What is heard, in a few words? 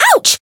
damaged; lele